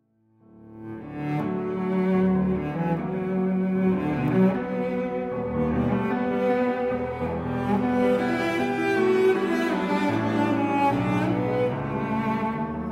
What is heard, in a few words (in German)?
Cellosonate